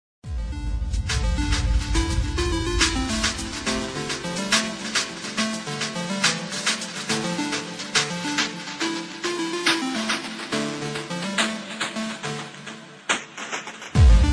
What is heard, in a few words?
dubstep rap vocal remix